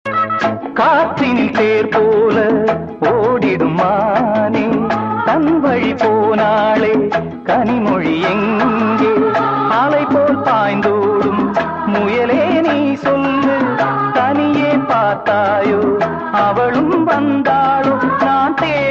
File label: Tamil Movie Song; Anbulla Rajinikanth